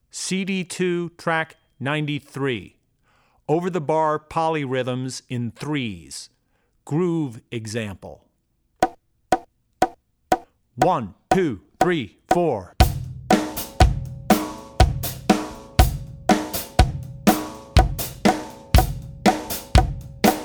Polyrhythms - Over The Bar 3s; Drummer's Bible Tracks

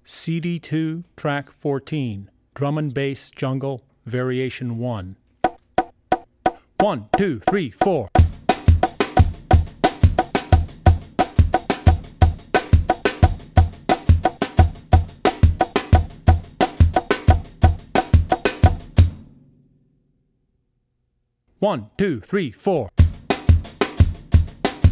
Drum & Bass Jungle; Jungle - Drum & Bass (Variation 1); Drummer's Bible Tracks

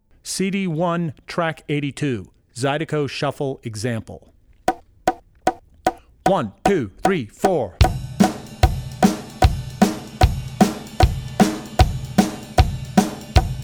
Zydeco Shuffle; Drummer's Bible Tracks

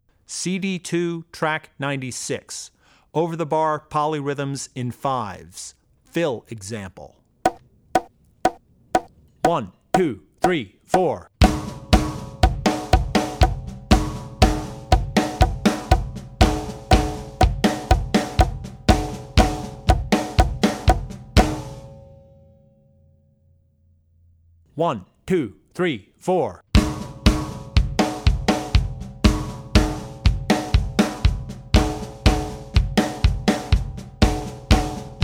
Polyrhythms - Over The Bar 5s (Fill); CD2 Track96 Polyrhythms - Over The Bar 5s; Drummer's Bible Tracks

